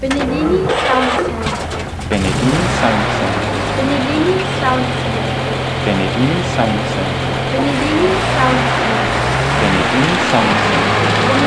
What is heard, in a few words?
SdKfz7